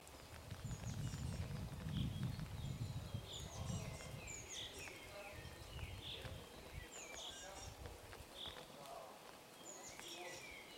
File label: birds; chernobyl